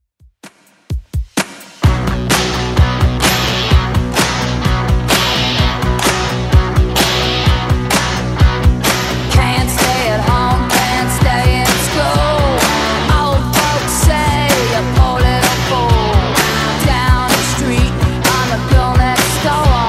80s Redrum 128bpm

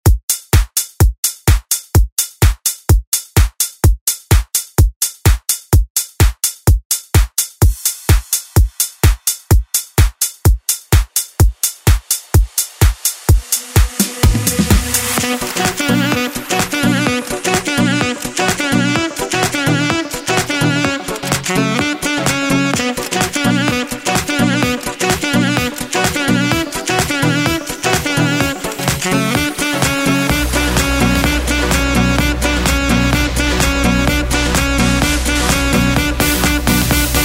Dance Redrum snippets; Deejay Hit

